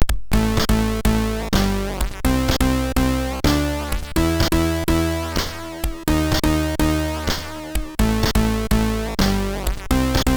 Lights On, MOS6581R3

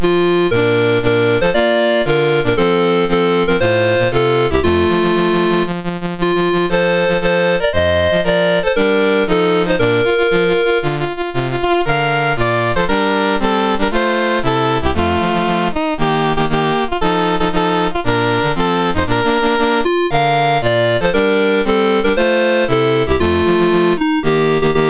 MOS6581R3, National Anthems; Iraq

